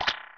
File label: Step In Water; Open Zelda 6.2.4; Quest Designer 2.0.4